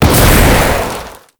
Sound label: Spike Orb Fire Impact; Warframe; Tau; Circles Of Hell